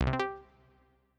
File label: new mail